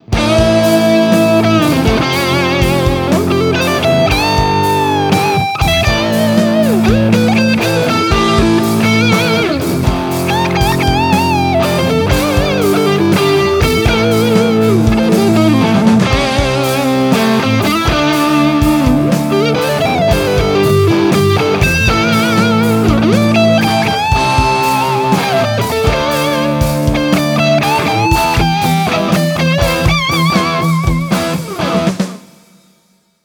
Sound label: guitar rig